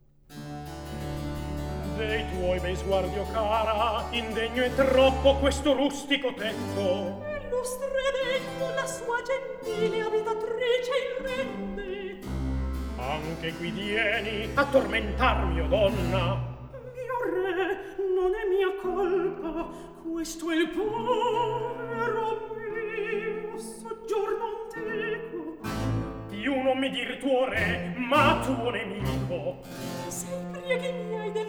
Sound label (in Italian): Recitativo; Atto II; Scene 10; (Gualtiero, Costanza, Griselda); De' tuoi bei sguardi, o cara, indegno e troppo